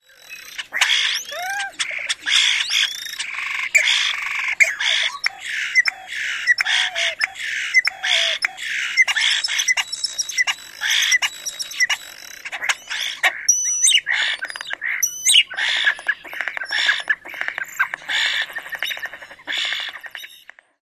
song; NY

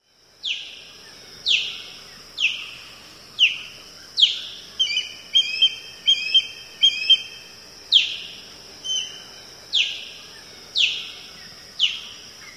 Osprey peeps & squeals

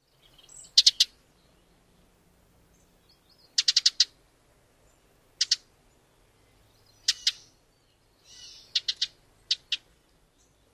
calls

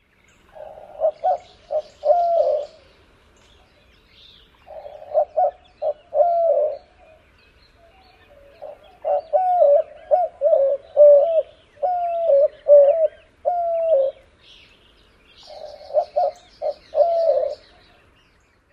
songs

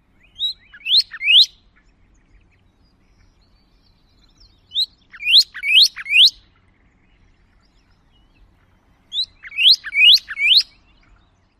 songs; TX

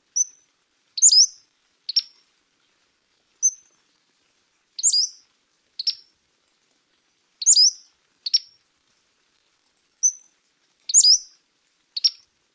song; CA

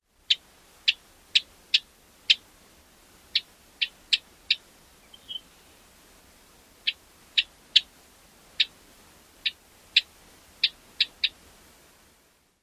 chips

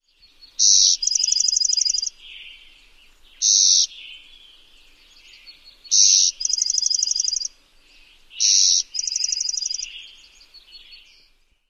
odd 2-parted buzzy songs; CA

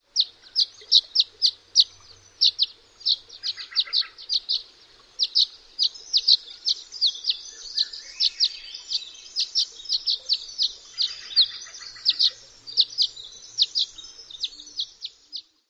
calls song

